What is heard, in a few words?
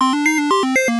video game bomb alert; mixkit